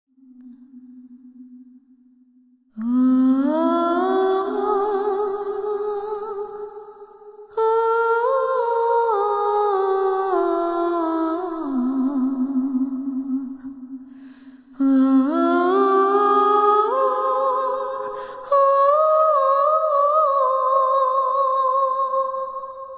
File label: Kundalini Chant